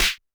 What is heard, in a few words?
TD-20 Tom A, Roland TD-20 Toms